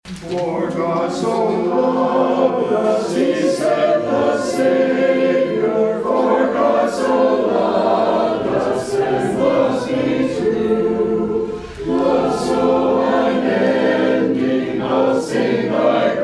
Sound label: hymn in English; For God So Loved Us